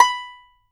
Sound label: banjo B5, banjo notes; Sampleheads - Whole Lotta Country 1 & 2